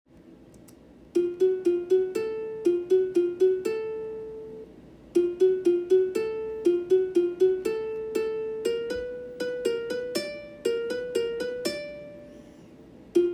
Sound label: lick